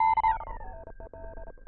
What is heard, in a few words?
hard morse, filtered morsecode like